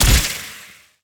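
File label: attack arrow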